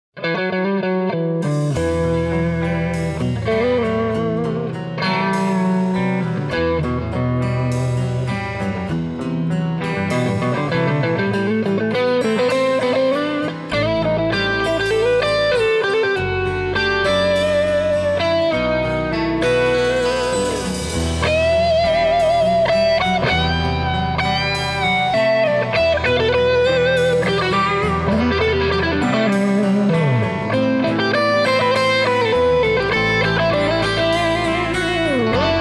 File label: Gibson LP Special Lollar P90s